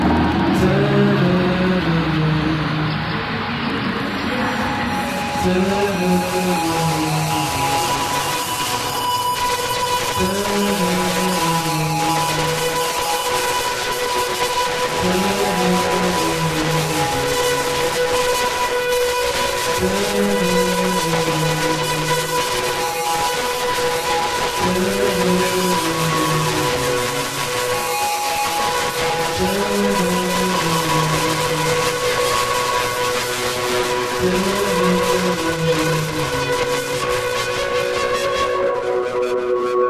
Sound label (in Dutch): muziek oorspronkelijk bedoeld voor 2 piano